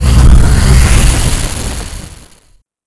jump in; endless sky

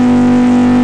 engine; stunt rally

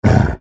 grub die; edgar; enemy

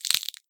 acorn crush; project hawk

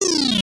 menu close; project hawk

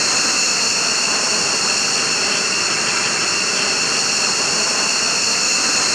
turbojet lopower; rigs of rods

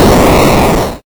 jump boom; project hawk

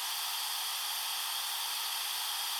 air; rigs of rods

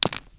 rock bounce; edgar

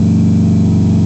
car idle; rigs of rods

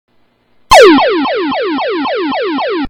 start level; missile command

